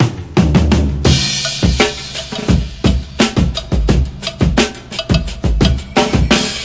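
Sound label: classic brk, 085 bpm; DRUM LOOPS and BREAKS; VIBRIS CLASSIC BREAKS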